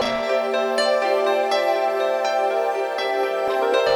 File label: piano, Loops, Sad